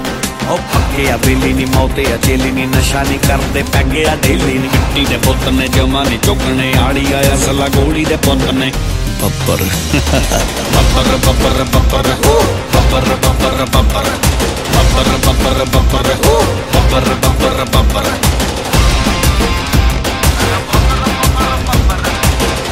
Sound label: Anthem; Babbar